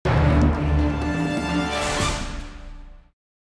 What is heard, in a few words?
End Credits Jingle; Fantastic Four Rise of the Silver Surfer; PS2 PS3 Wii Xbox 360 gamerip 2007